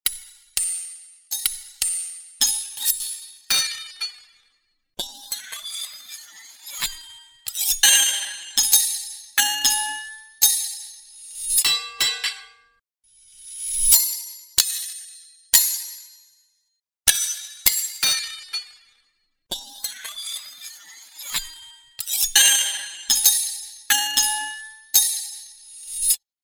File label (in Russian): Sabli